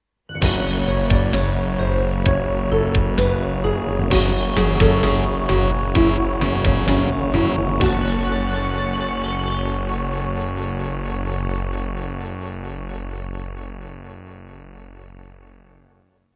Serdaco Crystal Blaster C1; Doom (Sigil) - Introduction

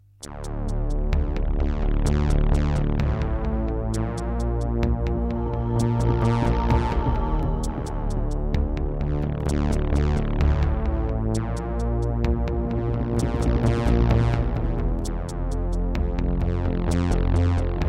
Aztech Sound Galaxy Wave Power; Descent II

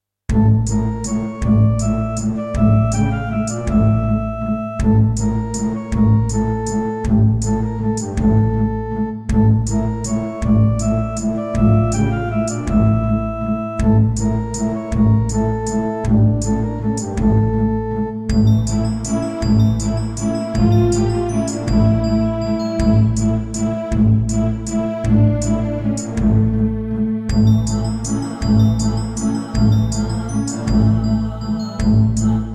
Yamaha DTXpress

